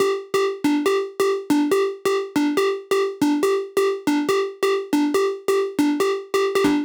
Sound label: Space Of Time Kit 140BPM SQ Synth DRY, G minor; Melody & Synth; Vengeance Samples